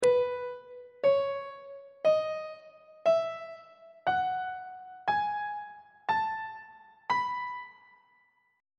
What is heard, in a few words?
b mixolydian mode note positions; on treble clef